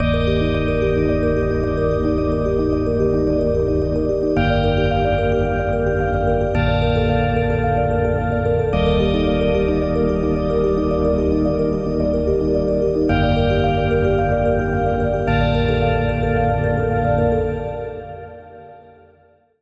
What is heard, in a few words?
Key, Spire; Routed Sound; Spire Expansion - Star Scavenger Vol.1